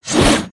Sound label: Swing; Little Friend